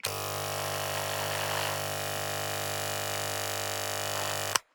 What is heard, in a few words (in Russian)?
zvuki mashinki dlia strizhki volos